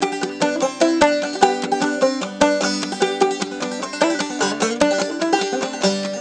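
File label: banjo solo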